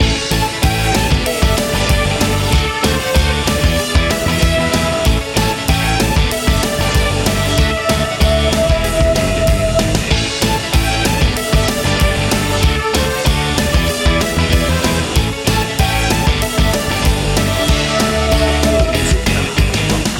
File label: energetic indie rock loop